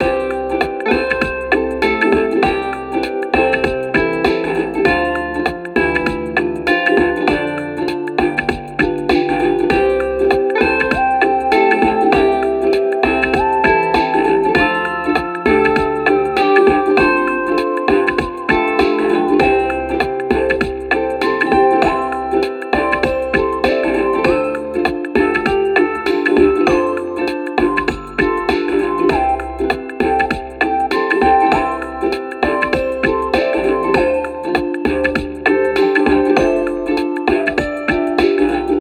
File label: Music Relaxed